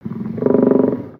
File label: Pet Trike Idle; creatures pets